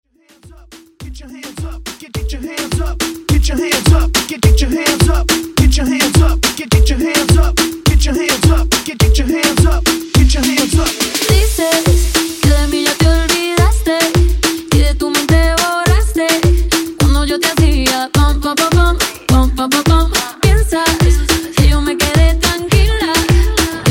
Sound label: Latino Reggaeton Bootleg